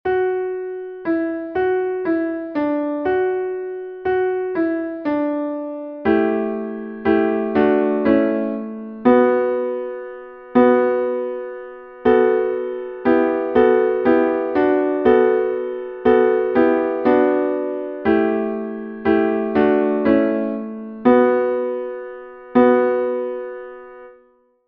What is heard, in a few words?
Piano; Mze Shina Meskheti